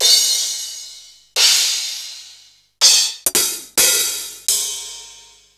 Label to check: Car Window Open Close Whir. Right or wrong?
Wrong